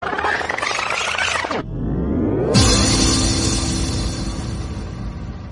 blowing wind new message tone ringtone

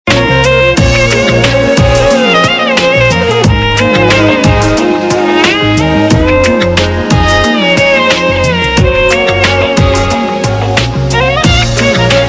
sad violin ringtone

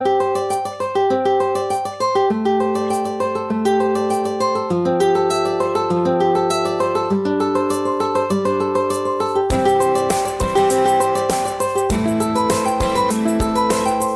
call sound phone ringtone

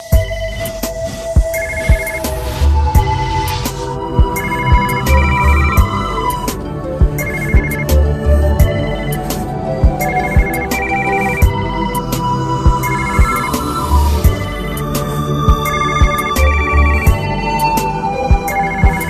calm ringtone

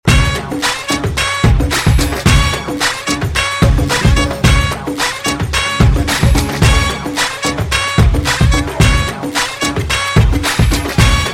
pipes ringtone; samsung

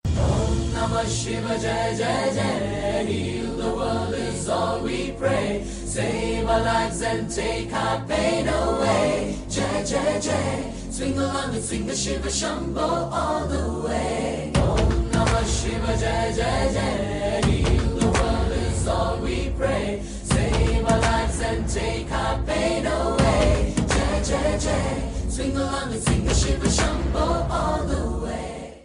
shiv mantra english ringtone

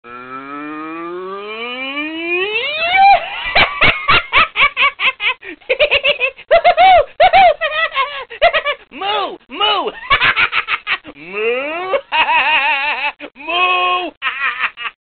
funny laugh background music tone

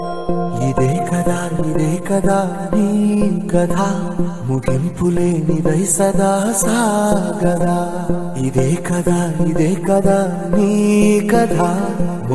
telugu music ringtone; bollywood ringtones

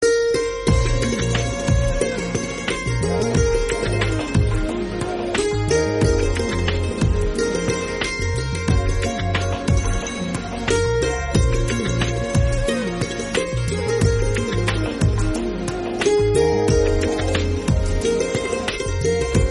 santoor instrumental music ringtone; bollywood

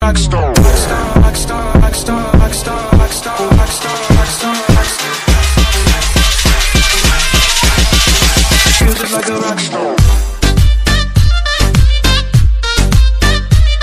english music ringtone

